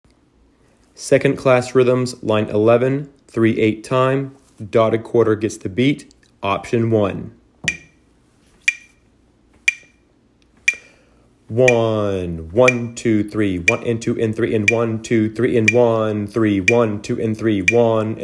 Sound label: rhythms dotted quarter